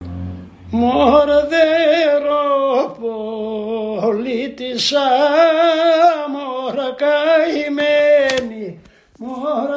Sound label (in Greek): Τραγούδι Παιδομαζώματος